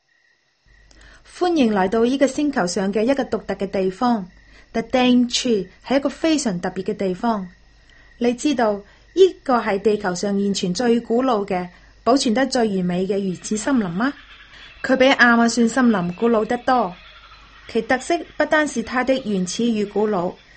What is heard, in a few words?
Canto